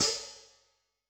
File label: Rock Ft Sp, HiHat, Yamaha DTXpress-II